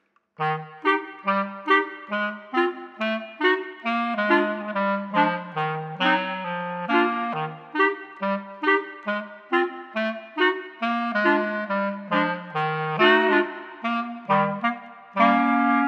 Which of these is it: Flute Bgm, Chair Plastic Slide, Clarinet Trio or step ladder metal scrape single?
Clarinet Trio